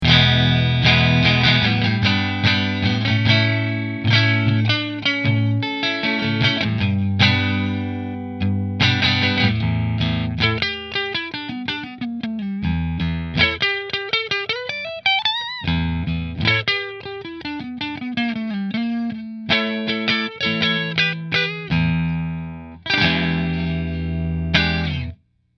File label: Position 3 Fender; Tonemonger